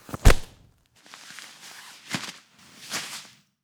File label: traveler rifle hit; Papers Please